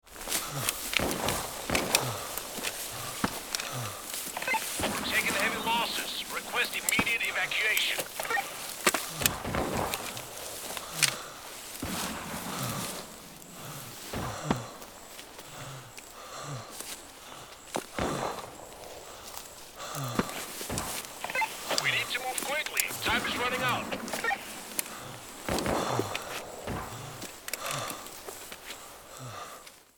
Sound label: Injured soldier moving through grass